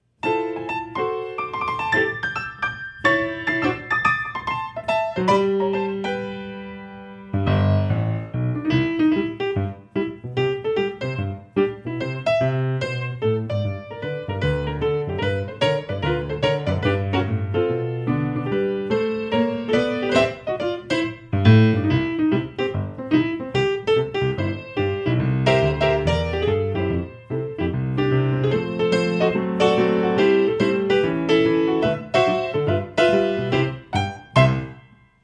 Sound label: Piano Cover with Improvisation